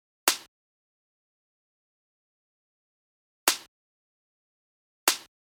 snare

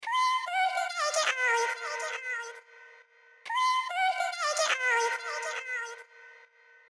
vocal

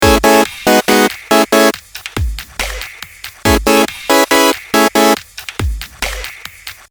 lead

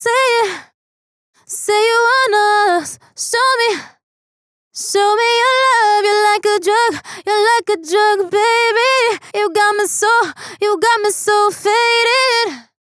vocal hook dry Fm, 144; VOX

